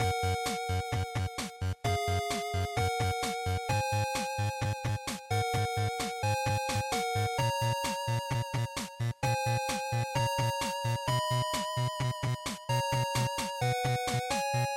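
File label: Game Boy Action Opening Loop, bgm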